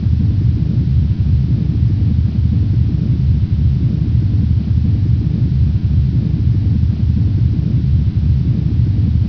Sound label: noise